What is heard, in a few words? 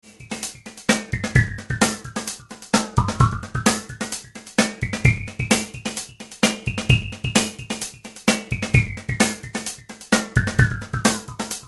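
Drumloop Frequency Sweep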